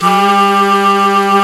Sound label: Flute, Singin' Flute